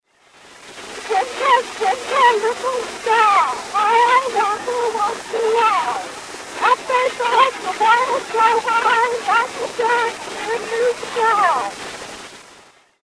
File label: 80 rpm restored; edison; lbnl